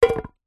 foley tin metal cylinder lid on set down on concrete held hand muted; zapsplat